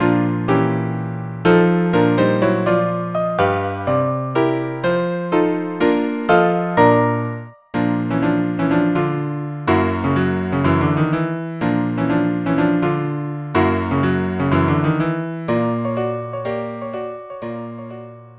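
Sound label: Blues string quartet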